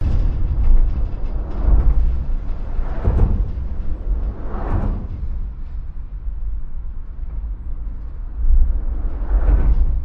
under bridge cars trucks motorcycles busses pickups vehicles traffic; vancouver; lions gate bridge; your free sounds